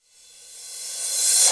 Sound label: FLUSH; SoundFactory